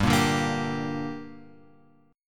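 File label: G Minor G, Gm Chord strum; Gm Chord {3 1 0 3 X 3} strummed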